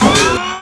jump; walker

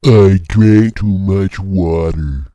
DROWN; ogro

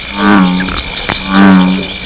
death; opentdm; citrusfrog

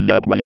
gurp

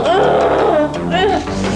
death

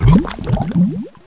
DROWN; openffa

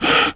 gasp; lmctf

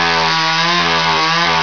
saw hit; rogue

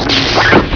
bump; opentdm; attic

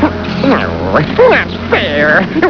death; vortex; waspy

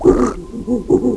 gurp

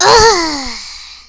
death; opentdm; astro

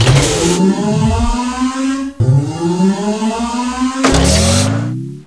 quad; lmctf

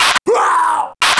radio; lmctf; andromeda

